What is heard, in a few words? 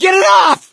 scream while pounced; source engine; left 4 dead 2; survivor; gambler